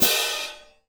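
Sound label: cymbal, Orchestral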